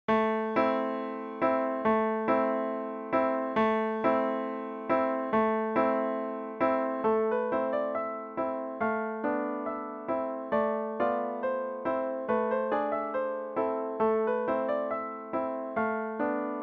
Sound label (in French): Les petits poissons piano